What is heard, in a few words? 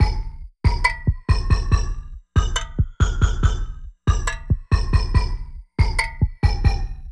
Loop